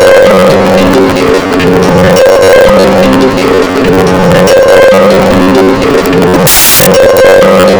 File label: ringtone recorded